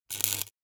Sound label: zap, energy